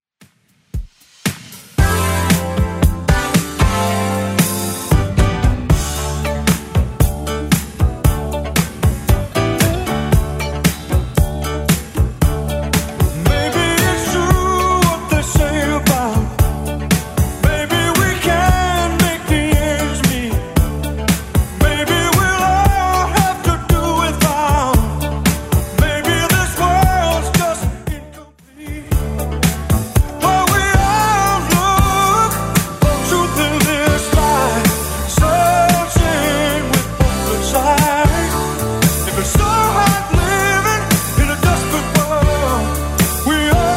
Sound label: 80s Redrum 115bpm